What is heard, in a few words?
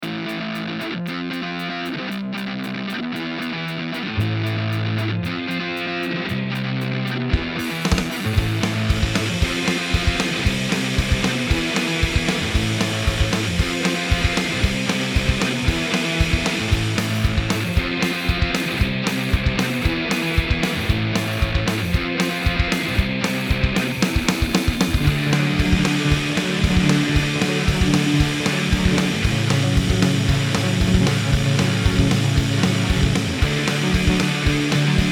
Disco